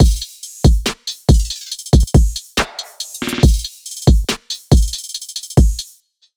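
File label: 140 bpm, A minor, Loop; Depriviation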